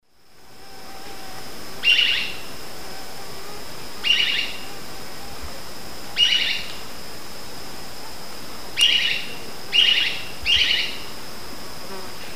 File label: Conopias parvus, call; S. America; Eastern Lowlands